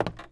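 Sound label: creak walk left, footsteps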